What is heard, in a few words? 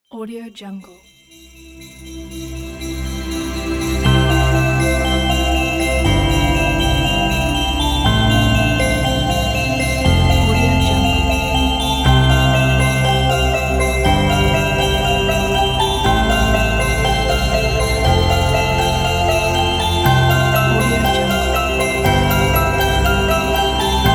christmas sleigh bells pack